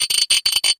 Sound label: Light Rattle